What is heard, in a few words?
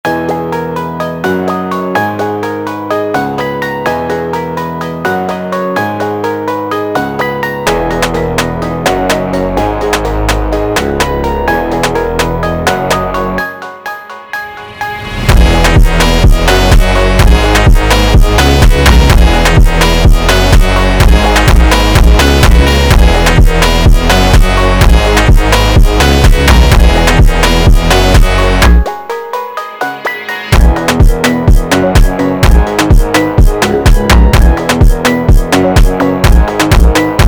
instrumental